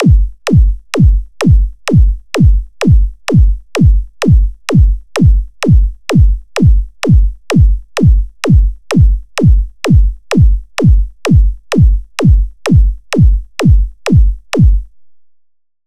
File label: Beat 128 bpm